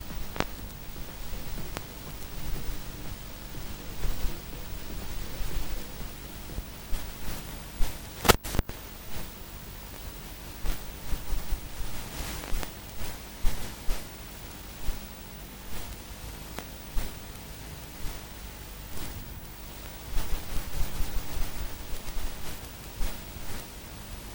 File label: VHS Cassete Noise; SFX; IRL Recorded